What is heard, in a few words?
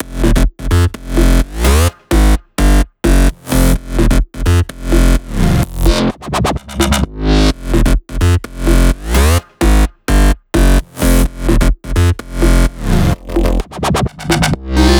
128BPM Melody Root F; Vengeance Samples; Body Kit